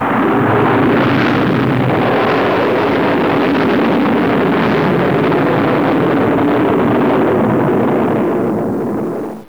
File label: THRUSTER